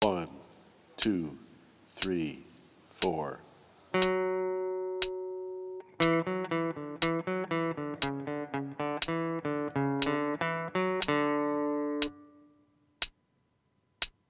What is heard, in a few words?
Melodic Dictation